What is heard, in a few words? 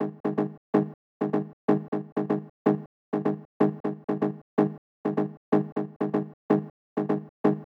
Stab G Rhythm